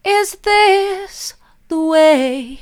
mid, vocal

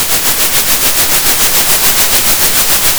center, Sound of a train

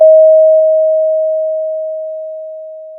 pop, Sound of a school bell

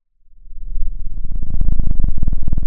pop, Sound of a cat purring

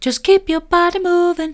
just keep your body moving soft, vocal

